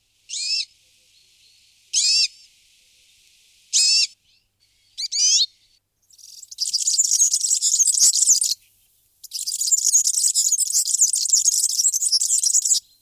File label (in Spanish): Verdecillo